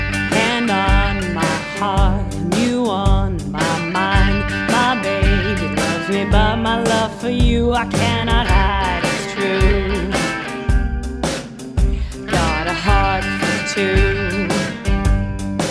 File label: Rockabilly stem; Music Delta